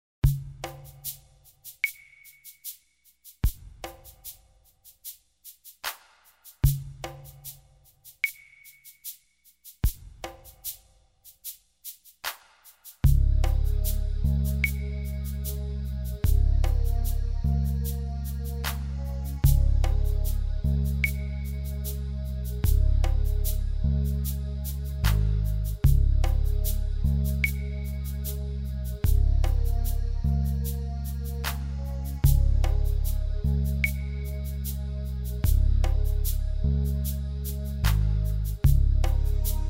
ambient

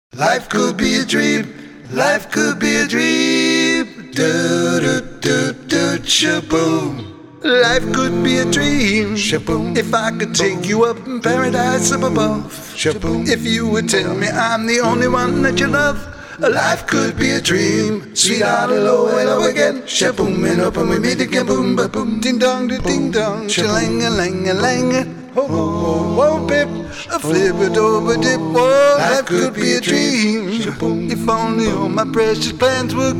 VOX

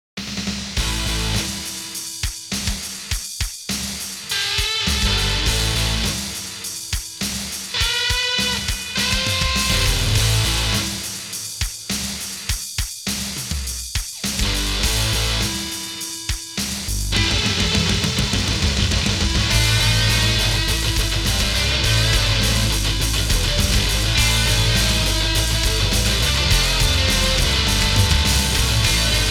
guitar jam

